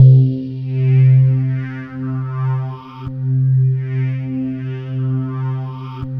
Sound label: quartz C3, ethereal atmospheres; Soundscan - Ethereal Atmospheres